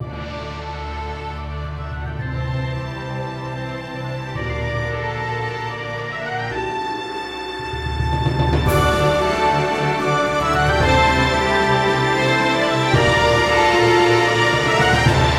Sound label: Soundscape of a Hero; Essense of Lime